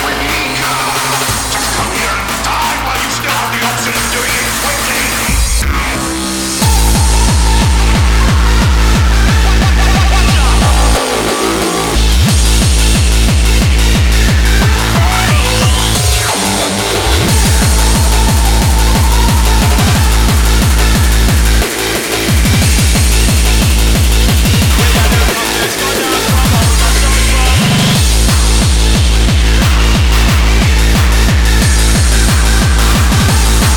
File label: Ruffneck Bass; mok313